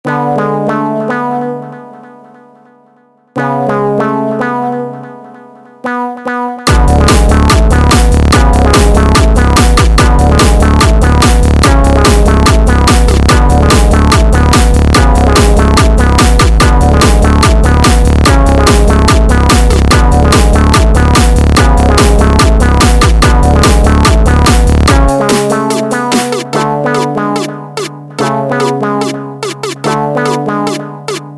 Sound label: Meow Boss Tekk speed Up